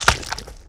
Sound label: Limb & Flesh Rip, Hand To Hand Combat